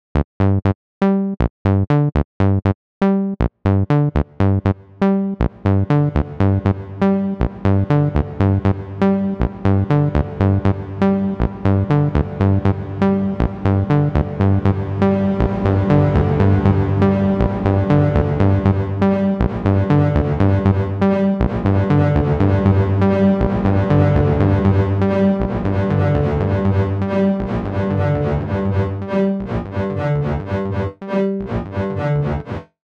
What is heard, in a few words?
Roland TB-03 Reverb